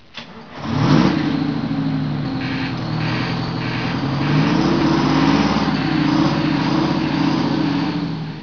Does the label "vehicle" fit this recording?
Yes